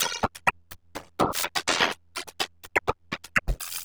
Granular, LOOP 125 BPM; Bolder Sounds - Granular

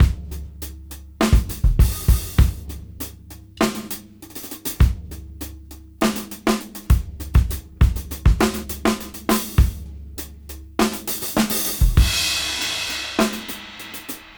Half Funk 101 BPM; AMG - Keith LeBlanc Phat Butt Jams

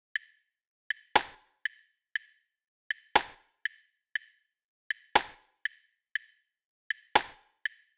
Loop 120, Perc Loops; Equipped Music; Loungin House

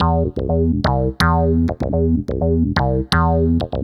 arp 125; Best Service ProSamples vol.10 - House; AKAI

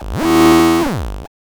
Air Raid Siren; Cwejman S1; Martian; 300 Drum Machines; DevSnd Cwejman S1 - JK

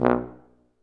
F TUBA, Solo Brass, Tuba stacc

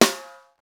SNR, Roland; Real Snrs

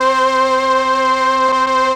STR C, STRING; Retro Sampler; Brass + String

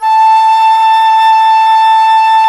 FLT XFLT, Flutes; Club-50 - Foundations Roland

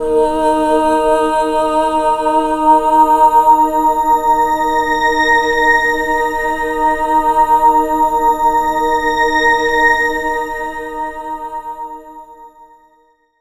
Morph, Pads; Jungle Warfare 1&2

